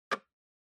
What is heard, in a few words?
Clock Minute Hand (Dry)